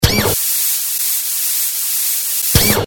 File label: airride; tunning